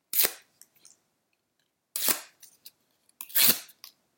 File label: Auditory Icons; Paper; oviatt